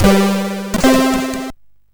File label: sid MOS6581R4